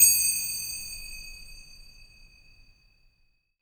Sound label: Indian Bell Hit Loop, Ethnic Instruments; Sonic Foundry (Sony Creative Software); The Electronic Music Manuscript Collection